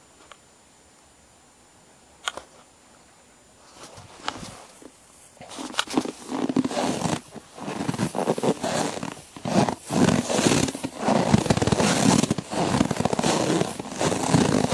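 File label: crunch of snow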